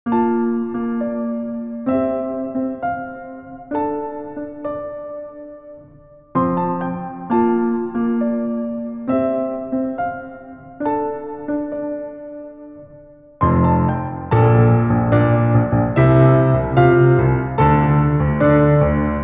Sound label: PIANO